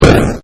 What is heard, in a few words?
damage; Wreck-It Ralph